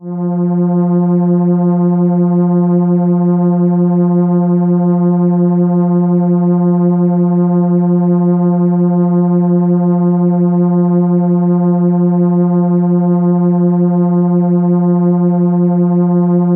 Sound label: Cello F4, ARP Solina